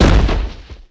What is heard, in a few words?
Bodyfall Large Creature; SFX Kit; Bodyfalls